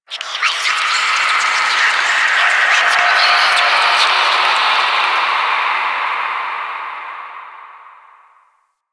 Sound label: Moon Whispers Small, Ambience; Warframe; Orokin